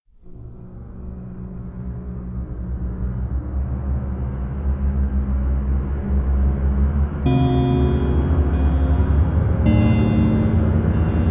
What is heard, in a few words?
carillons, chiptunes